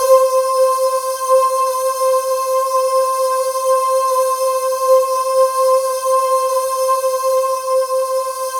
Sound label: C5, Synth Leads